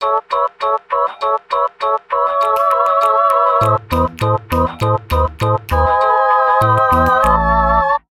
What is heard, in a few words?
Musicblock